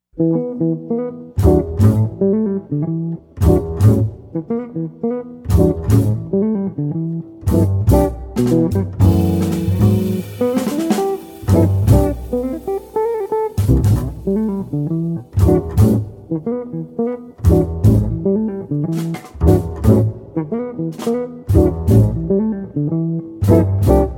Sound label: Jingles